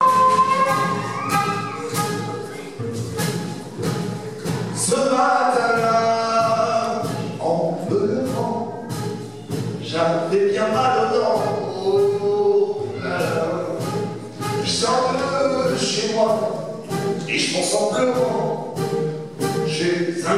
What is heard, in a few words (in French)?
blues; dentiste; salle Pierre Mauroy de Villers-Plouich